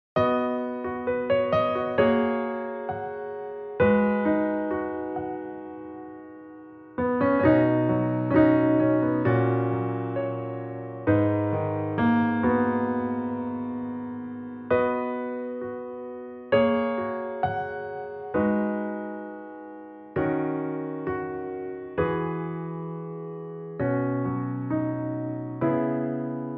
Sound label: Acoustic, MR